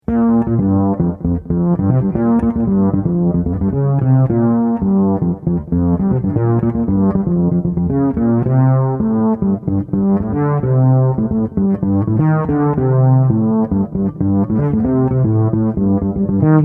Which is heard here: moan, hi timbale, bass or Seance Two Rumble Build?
bass